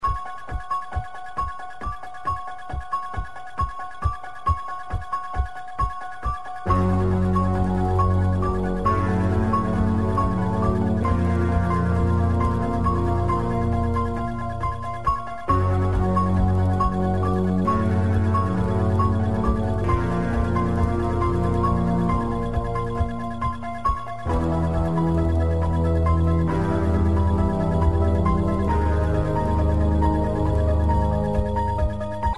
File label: dun dun dun; burgerking